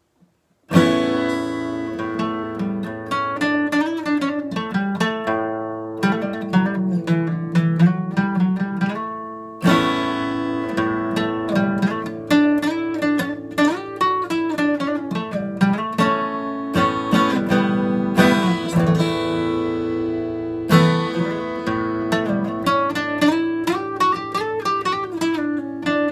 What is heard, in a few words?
Acoustic Intro